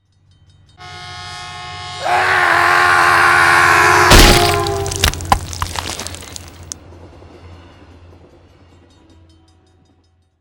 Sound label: Train Hits Guy